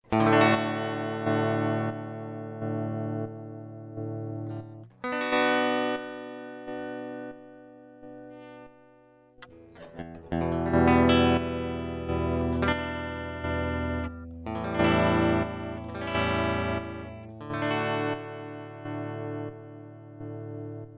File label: stompbox tremulus slow; 4heads